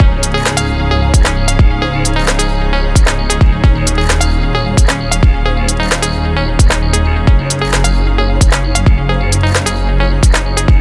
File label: background technology